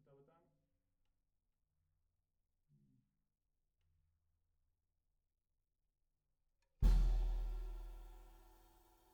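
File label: Snare Bottom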